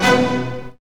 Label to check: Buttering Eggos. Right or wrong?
Wrong